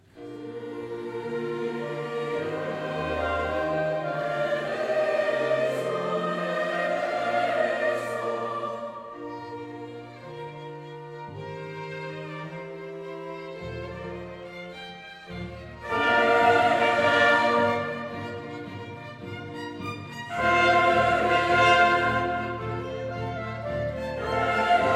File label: Kyrie, Kirchenchor